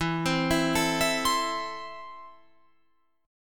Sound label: E Suspended 4th Sharp 5th, Guitar Open F; Esus4#5; arpeggiated